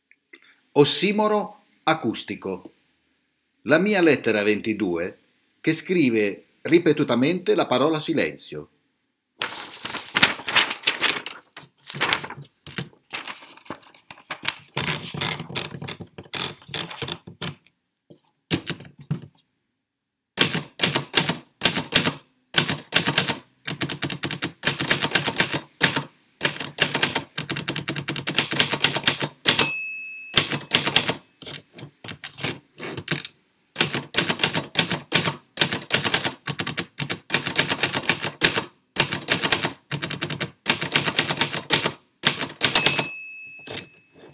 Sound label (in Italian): Ossimoro acustico